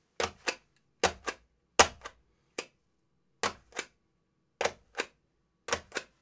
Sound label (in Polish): Pieczatka, stamp